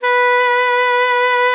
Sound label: B4, ALTOSAX; Xsample VOL-2; 90 s Sample CDs